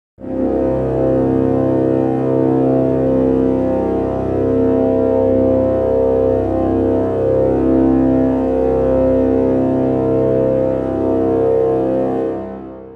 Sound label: C Pedal